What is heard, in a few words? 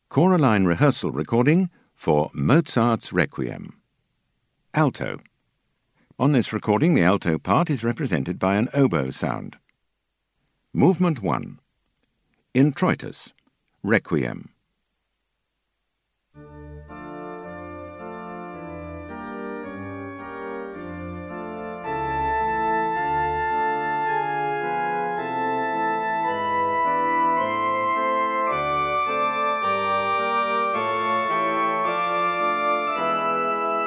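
Alto; Mozart Req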